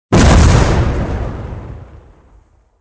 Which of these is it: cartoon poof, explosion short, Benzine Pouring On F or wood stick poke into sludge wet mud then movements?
explosion short